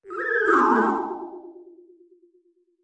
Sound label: moan